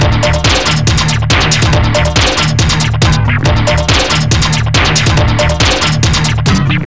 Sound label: Overdrive, Drums Beats